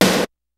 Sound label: kicks snare, kid; Kid Nepro; Elite; KICKS SNARES